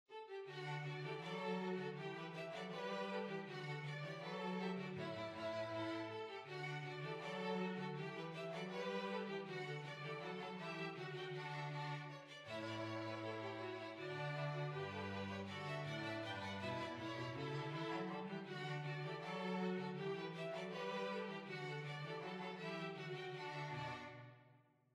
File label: Hornpipe